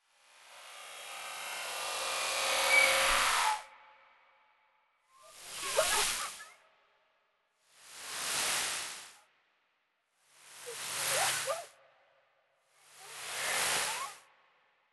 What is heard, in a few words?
Swoosh Magic Flashback Bright Light Spark Noise Short Glitch